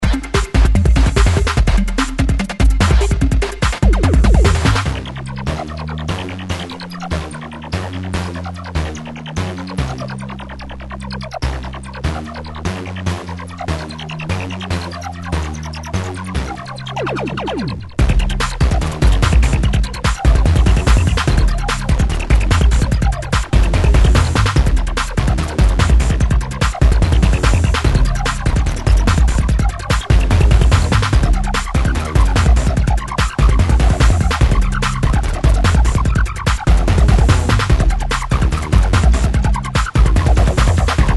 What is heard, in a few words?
Deep Bass; inst; Matrix